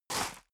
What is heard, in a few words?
step boots gravel medium slide short; schritte